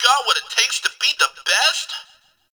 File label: Game Bark; Atomicycle; Warframe; Ambience; Nineteen Ninety Nine